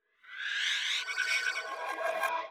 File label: pewhoosh